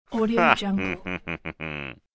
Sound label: male doubting nasal laugh; fxprosound